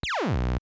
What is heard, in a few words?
chip bass